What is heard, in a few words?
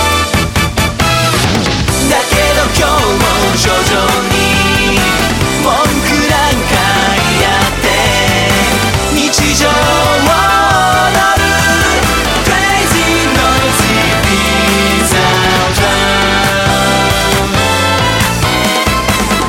Chorus